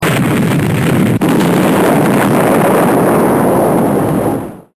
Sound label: Nuke explosion